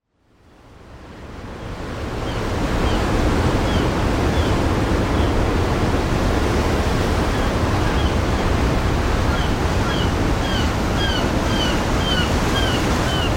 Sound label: Ocean Waves Coming in Heavy Surf Roar